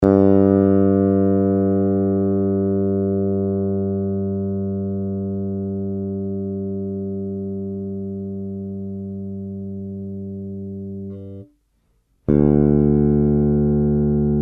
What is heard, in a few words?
P Sustain; P Pickup Sustain; Zerberus